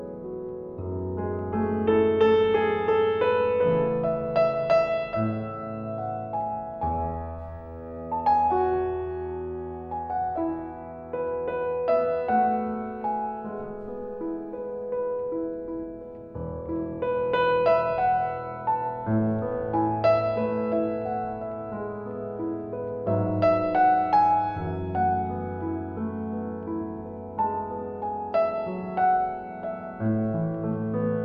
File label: Piano Cello Cover